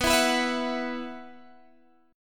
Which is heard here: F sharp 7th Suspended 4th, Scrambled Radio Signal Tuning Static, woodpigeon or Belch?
F sharp 7th Suspended 4th